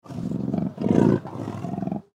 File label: Leopard Breathy Growl; Angry; Leopard Sound Effects; Animal